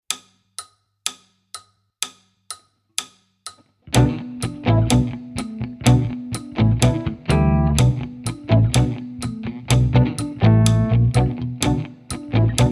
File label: tick tack instrumental